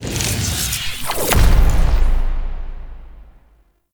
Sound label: UI Common Level Up Lead In